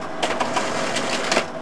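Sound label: copy machine loop; Tony Hawk's Project 8; XBOX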